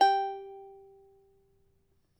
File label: CHARANGO G 3; Spectrasonics; Hans Zimmer Guitars VOL-2